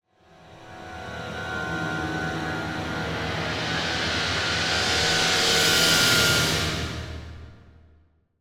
Symphonic Hit Troubled Calls; Hollywood Edge; sound designer's toolkit v2

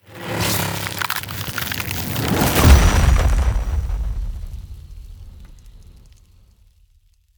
Ice Spell Transformation Giant Growth; Arctic Aura

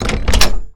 door; firefight

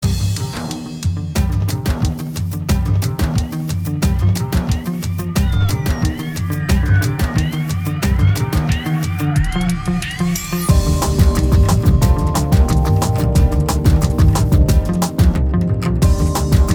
Chaise Lounge Long, Jingles

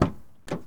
Mustang Door Open; Automobiles

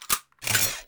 Homing Device Attach; SFX Kit; Foley - Misc

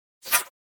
Whoosh Fast Sci-Fi Small Air; Hollywood Edge; sound designer's toolkit v2

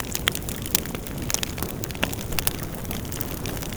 Fire Campfire Loop; SFX Kit; Destruction

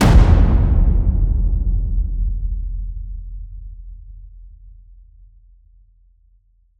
HIT Epic Drums, Cinematic Hits Designed

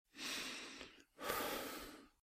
Breathe - Half breathless; Eden; RadwinIsland

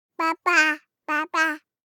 BABIES TALK Boy 12 months Baba Dada; Baby Boom

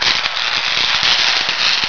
e sparks, Electric sounds; 1001 Sound Effects

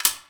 Menu Click

